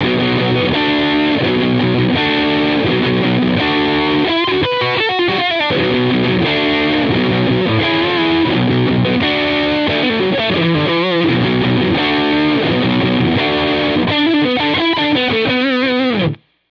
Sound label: Distorsion, pedales; DS830; Distorsion Master